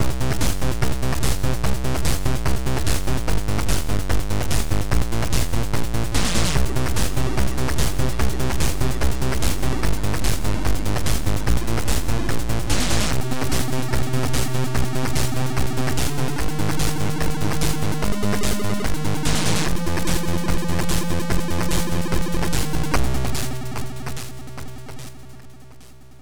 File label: DARKTUNE